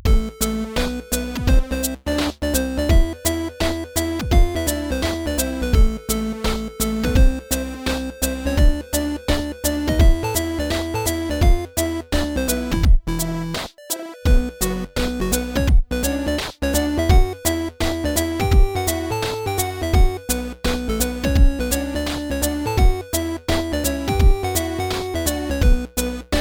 Chiptune Remix

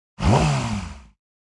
Board Upgrade; Subway Surfers City; Android, iOS

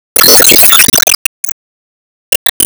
Enemy Big flame Active Start; Disney Star Smash; Android, iOS, Mobile

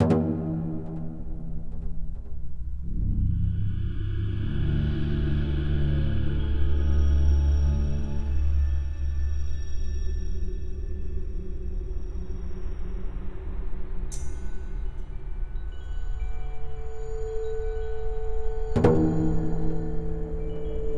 Amb Indoor

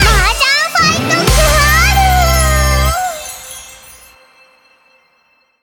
BGM Reach

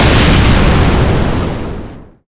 Enemy Bigflame Active Hit; Disney Star Smash; Android, iOS, Mobile

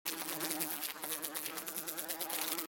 Fly Buzz Loop; The Binding of Isaac

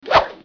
Kill Knife; gamerip; Wild West Shootout

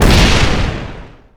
Bomb daruma Active Blast

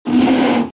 Monster Roar

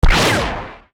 Enemy Woodblock Minion Active Shot; gamerip; disney star smash; android

